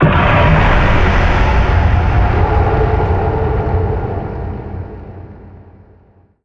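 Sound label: guard attack, creature; GammaAge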